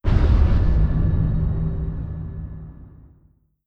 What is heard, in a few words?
distance ion cannon hit; apocalypse